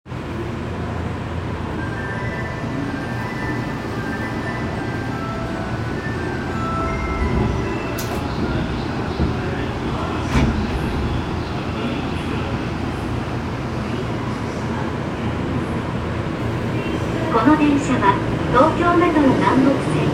三田線白金台北

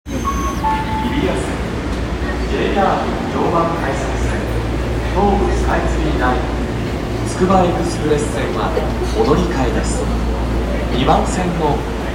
千代田線北千住東

